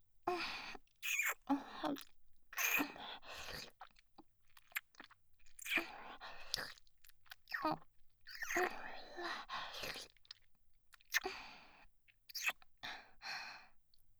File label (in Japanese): キス音